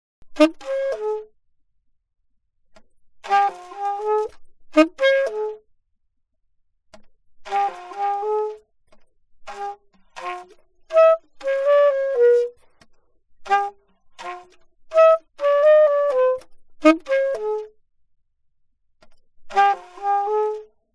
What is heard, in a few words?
altosax